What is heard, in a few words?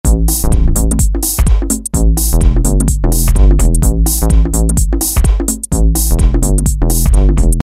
bts-Hardkore; E-mu Orbit V2; Factory presets